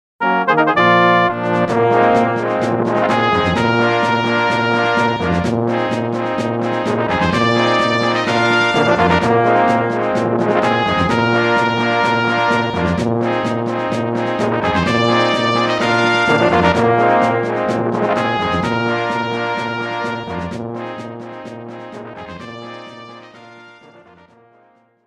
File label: Level Up; thracia 776 original soundtrack